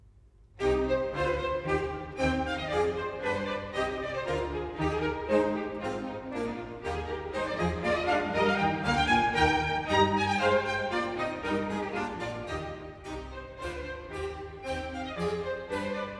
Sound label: Concerto Grosso in C minor, Allegro; Handel Masterworks; Klassika